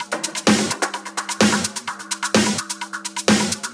Percussive Loops 128BPM; Vengeance Samples

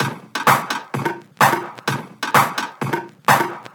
Minimal Loops

